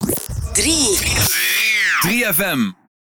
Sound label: Sweep